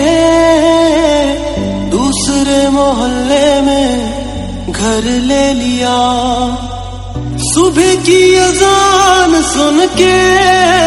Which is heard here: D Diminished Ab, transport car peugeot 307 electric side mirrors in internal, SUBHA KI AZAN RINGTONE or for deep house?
SUBHA KI AZAN RINGTONE